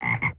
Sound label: Bullfrog